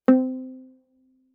Viola pizz ff sul G C4; MIS Pitches - 2014; Strings

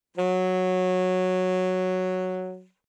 Alto Sax No Vib ff Gb3, Eb Alto Saxophone; Woodwinds; MIS Pitches - 2014

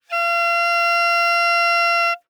Bass Clarinet ff F5 stereo; Woodwinds

